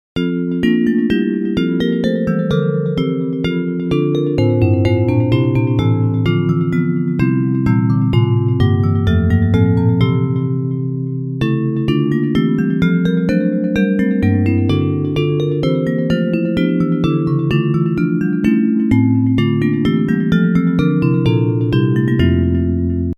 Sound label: Bells